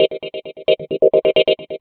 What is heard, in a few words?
Chords